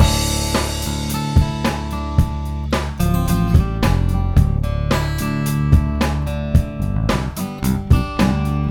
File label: music; Magic Game